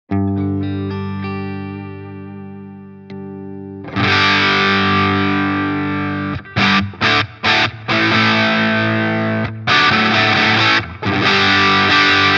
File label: Earthquaker Devices White Light V2 Gain 12h Weight max Comp